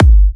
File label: G, kicks; markus hakala kicks